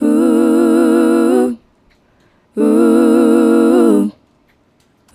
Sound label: Female Vocal